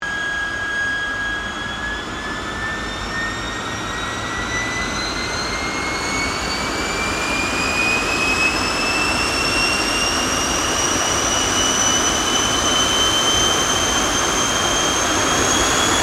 AIRCRAFT GLOSTER METEOR F MK8 TAXI, Gloster Meteor fighter jet; Airplanes; Vehicles